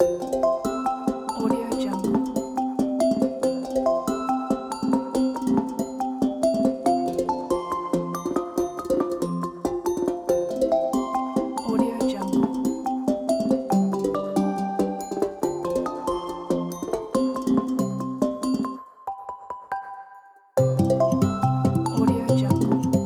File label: gamedev menu ragtime loop